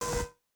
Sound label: open door